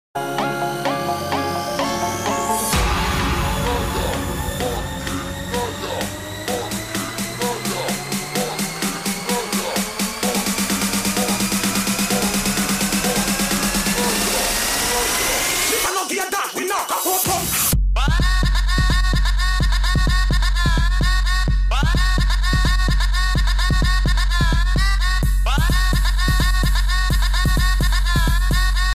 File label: powerful trance ringtone